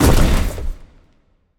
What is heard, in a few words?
bodyfall; smite; khepri